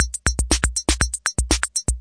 stright casio calculator, 120 bpm

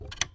friction up, Typewriter Kit

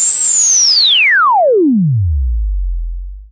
noise SH09, noise sweeps

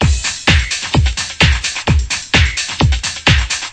130 euro whistle, drum loops and breaks

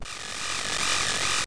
distorted generator; Weird and Interesting Hits; single hits

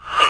guiro, Clangy Crunchy Foundsound Kit

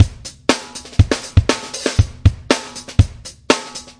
120 straight clean funk, drum loops

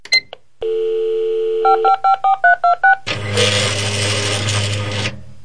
fax send; Sound FX Real Life

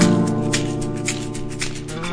brazil guitar shaker; SAMPLED MUSIC LOOPS

